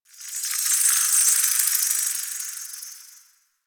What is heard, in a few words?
Insect Buzz; Ambience; One Offs; Warframe; Eidolon Plains